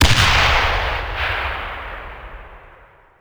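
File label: bazooka soldier fire; WAR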